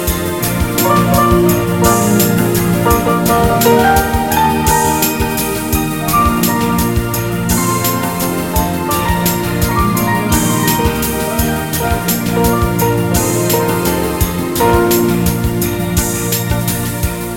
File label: Soft Slow and Deep Sounds